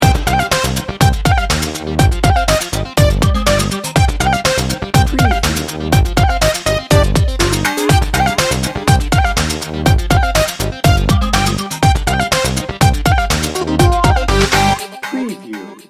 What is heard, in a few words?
bouncy and bright 15 second